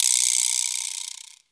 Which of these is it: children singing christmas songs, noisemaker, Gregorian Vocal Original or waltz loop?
noisemaker